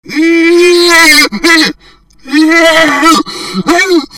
scream, zombie screams

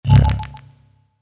teleport use, teleporter; weapons; grubs

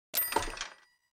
buy; ui; koth

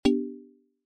flag reached; micromayhem

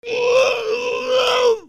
scream, zombie screams

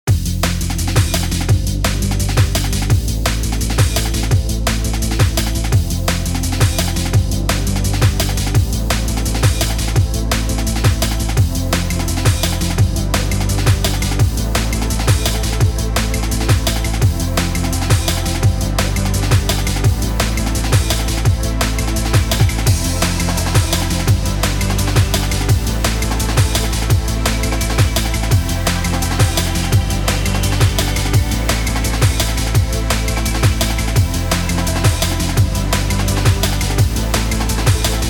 background drum and bass; terrytarget

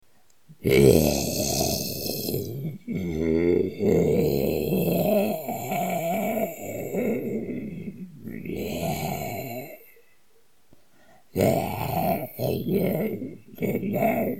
zombie sound effect; outbreaksurvival

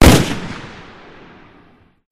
l96a1 fire; weapons; swb

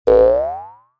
bounce pad boing; obstacles; micromayhem

